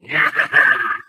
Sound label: ballbot jump